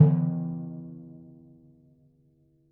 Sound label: E2 p, Timpani, piano; PowerFX; Downtown Orchestra; scales